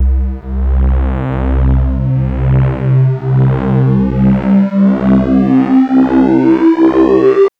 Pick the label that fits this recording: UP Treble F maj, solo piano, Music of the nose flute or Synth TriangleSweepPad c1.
Synth TriangleSweepPad c1